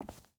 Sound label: footstep hide concrete walk; Rust